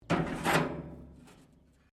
metal rusty move; Materials

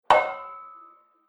Treated Metal Hit; Metal Sound Effects; Impact

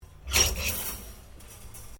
Coat Hangers Metal Movement, Clothes hangers

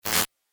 Laser Static; Laser Sound; Multimedia

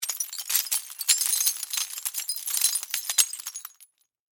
Impact Glass Debris; Sound Effects:Materials; Blast Wave

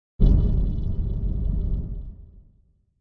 Flame; Sound Design

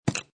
Pop Open Plastic Lid From Spray Can; Spraying Sound Effects

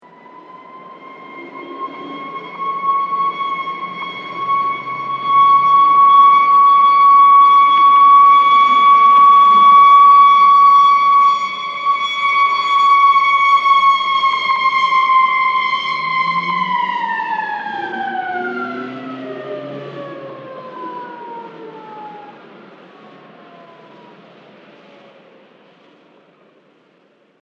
Police Siren Wail Long Traffic; Emergency Vehicle; Emergency Vehicles

